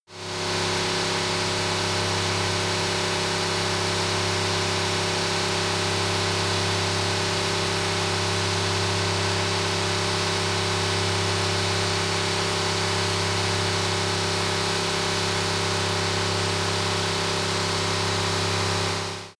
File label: car camaro very high rpm engine